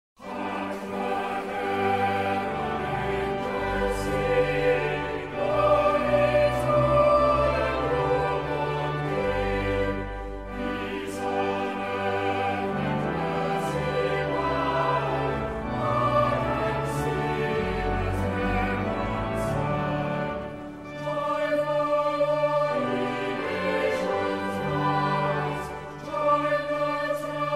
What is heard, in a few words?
Cathedral Choir, Hark! The Herald Angels Sing